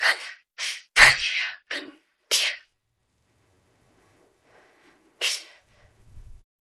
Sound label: jingle